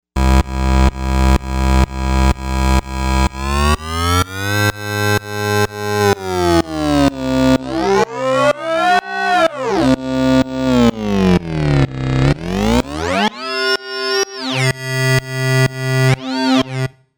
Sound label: Sync & Slide; Novation UltraNova; ChuckieSoundpack